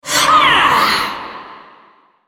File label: spellcast effort; Syndra